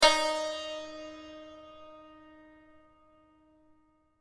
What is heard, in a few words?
santor mi